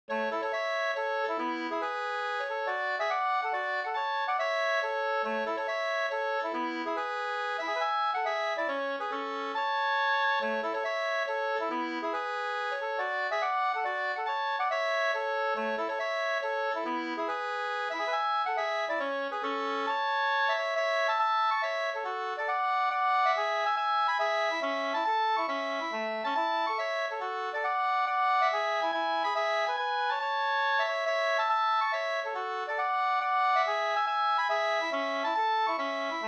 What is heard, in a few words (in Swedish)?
hambopolska